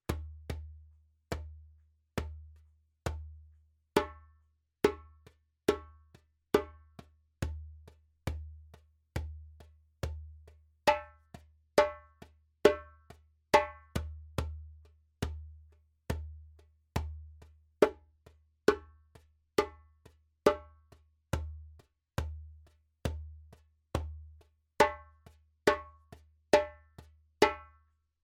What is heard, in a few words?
djembe; djembebog oevelse